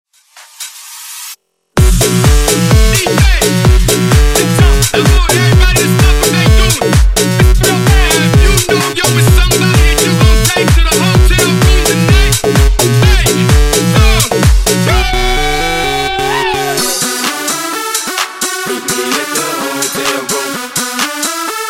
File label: G Funk Remix 128 Bpm